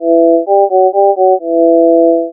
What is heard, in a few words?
Chord And Clamp; SoundGen